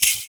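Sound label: PERC, percussions; Univers Sons - Raricussions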